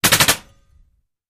Bullet Impacts Metal

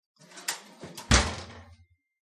Oven Door Closes; appliances

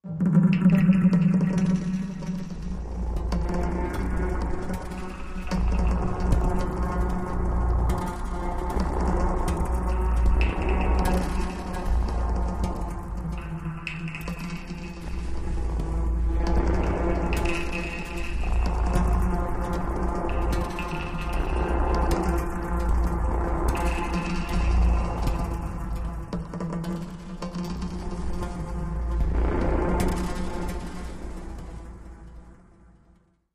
Down Shift High Tumblin, eerie ambience

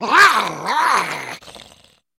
Monster Growls RaowR, human vocals

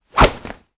Sword Swishes Cloth H, whooshes & swishes

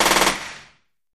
Machine Gun Sngl Rap, single burst

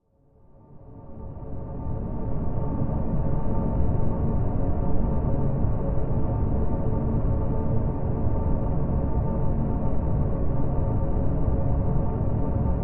Music Eerie Tonal, pads

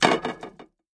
Plate Plastic Setti; eating

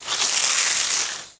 Water Hose Spraying A Tree, Hard Start

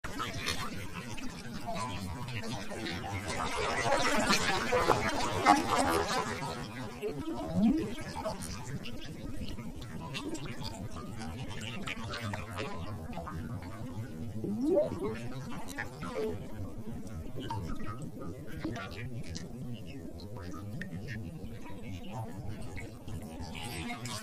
Alien Transmission

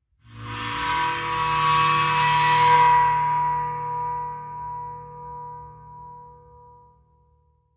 China Cymbal Bow, cymbal & gong; China 16 Cymbol Bowed 2

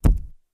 Camera flash, flash pot, deeper, less explosive; cameras

